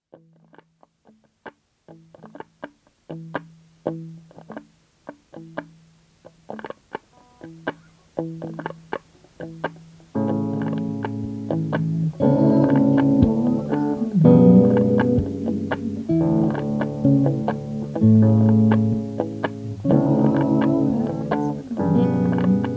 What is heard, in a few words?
rhumba